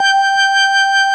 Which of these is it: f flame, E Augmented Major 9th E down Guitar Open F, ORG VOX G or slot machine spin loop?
ORG VOX G